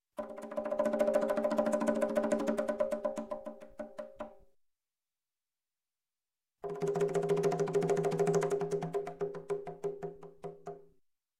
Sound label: Drums, Conga roll, Music, Percussion; Sound Ideas; Series 2000